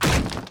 wood damage; Bad Piggies

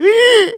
piglet fear; Bad Piggies

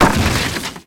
wood joint break; Bad Piggies

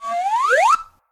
prize fly

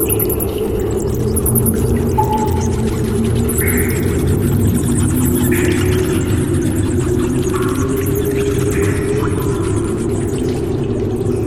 ambient cave; rise and swine; music collection

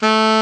Alto Sax A3; soundbank; instruments

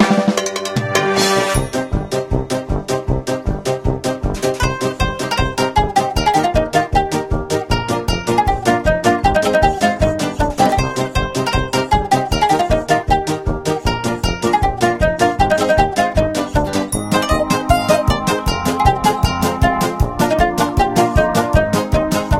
main menu short; music collection

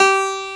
Acoustic Piano G4; soundbank; instruments

